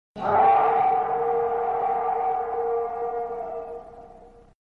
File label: werewolf howl